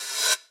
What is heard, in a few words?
Cymbals Reverse Crash; Vengeance Samples